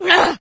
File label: become alert, male, infected